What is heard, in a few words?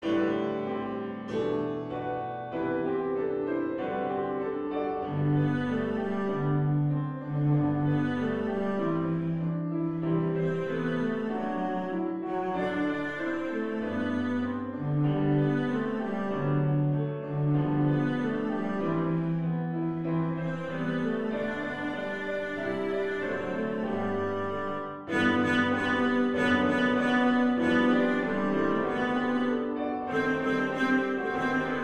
jingle bells; pierpont james; vive le vent